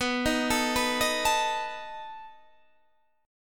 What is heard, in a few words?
B 7th B down Guitar Standard; B7 arpeggiated; JGuitar